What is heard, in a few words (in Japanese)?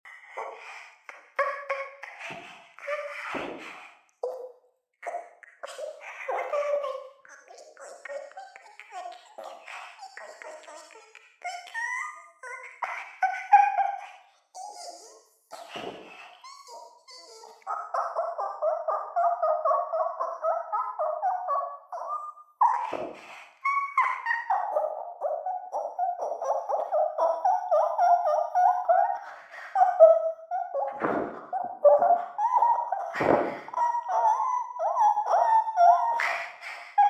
脳内 イキ声